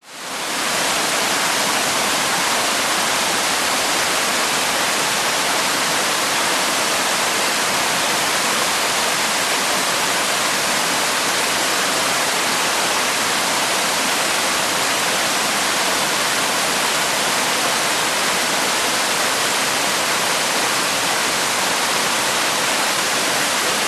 nature waterfall 80m high base pool pointing up xy